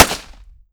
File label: 45 ACP 1911 Pistol Gunshot; Weapons; Gunshots